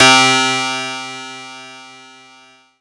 Casio VZ-10M Harpsichord C3

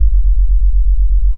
Ensoniq SD-1 Bass Rumble C2

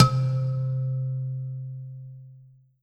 Korg TR Rack Mondo Rimba C3

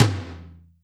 Roland R-8 Power Tom

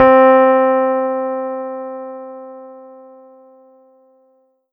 Ensoniq ZR 76 Real Thing C4

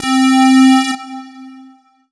Kawai K5000W Add Square C4

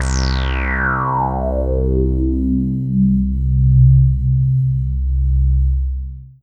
Korg DW 8000 Saw Pulse Bass C2

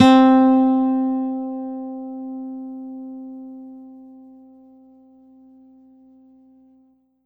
Korg N1R Flamenco C4

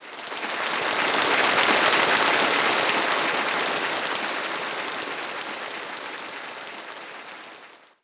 Synthesized Applause

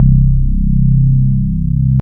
Yamaha TX81Z Analog Organ C3

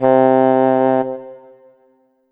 Kawai K5000W Oboe C3

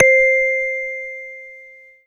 Casio VZ 10M Celeste C5

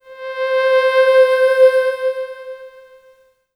Yamaha TG100 Warm Pd C5

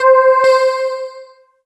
Casio CTK-611 Echoes C5

